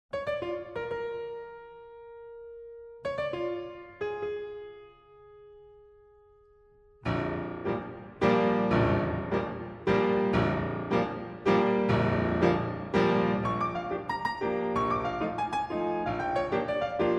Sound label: Prelude